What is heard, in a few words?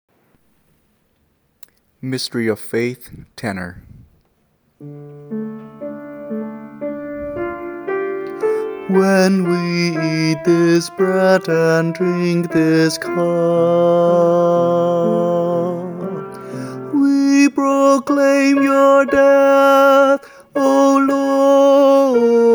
Mystery of faith Tenor